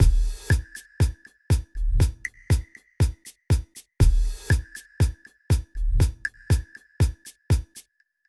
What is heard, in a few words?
Drum Beat 120 Bpm